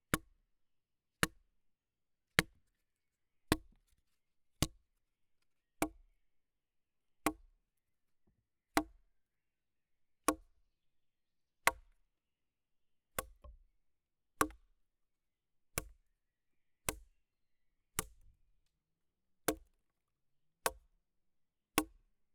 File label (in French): Baton sur buche qui sonne bien serie, Percu Bois